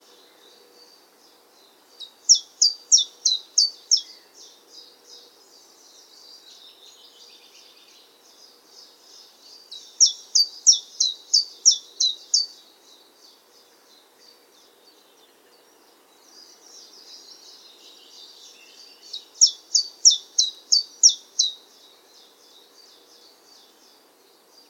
tjiftjaf zang

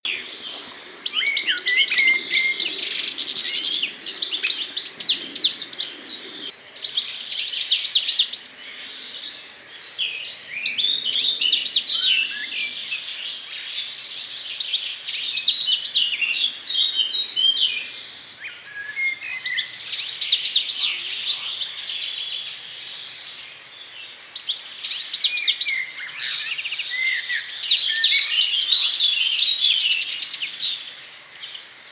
putter zang

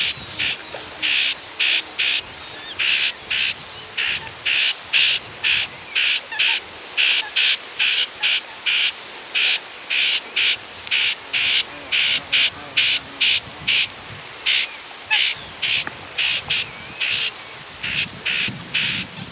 grasmus alarmroep

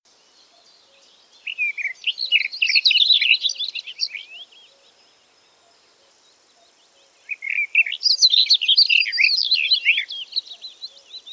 tuinfluiter zang

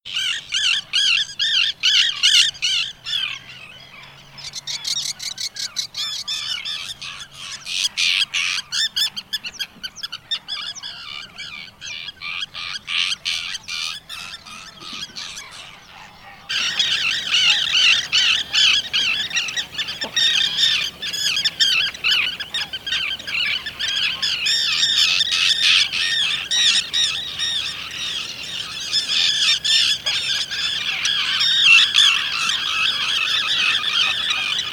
visdief roep

